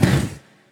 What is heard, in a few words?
robot fire; boss